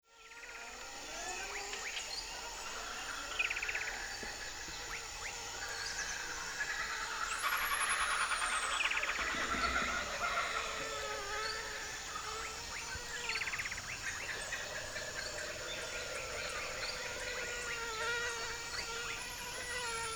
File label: ambient